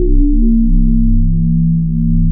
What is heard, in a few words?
MELLOW SQR, synths; Pro-Rec - Synthsonic